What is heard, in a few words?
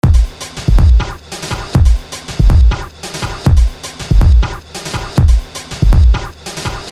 drum beat